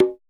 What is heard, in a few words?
CGA HI, R+B KITS; JACK